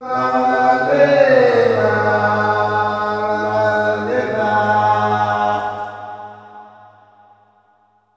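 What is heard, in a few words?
MALE MOURN, MALE CALLS; Spectrasonics; Heart of Africa 2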